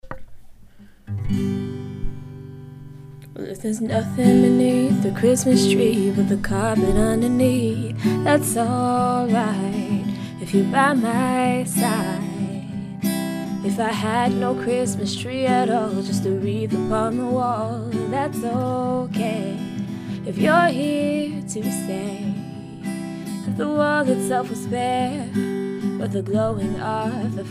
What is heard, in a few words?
Christmas Jingle